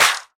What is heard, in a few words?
Dry Hand Clap C# Key, Royality free clap single shot tuned to the C# note. Loudest frequency: 3348Hz; single hits one shots